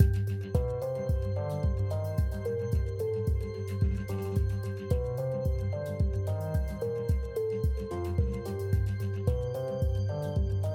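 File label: balloon background music bonus game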